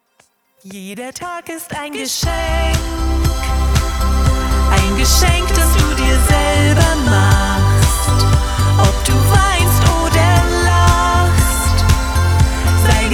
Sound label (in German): Schlager Party